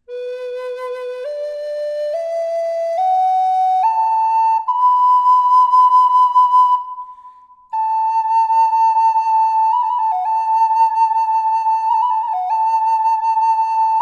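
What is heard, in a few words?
reverb, flute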